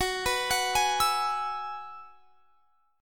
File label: F sharp 9th Suspended 4th F sharp, chord; F#9sus4 Chord; arpeggiated; x,21,21,23,21,24